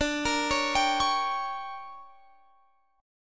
D Major 7th Suspended 4th Sharp 5th, Guitar Open F; DM7sus4#5 arpeggiated